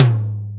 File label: soft hitclap